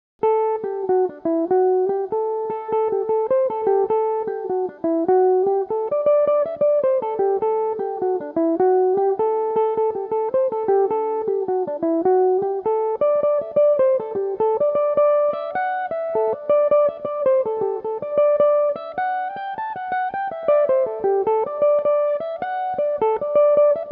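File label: A dorian, irish mandolin, tunes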